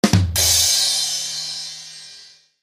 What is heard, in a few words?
Rim Shot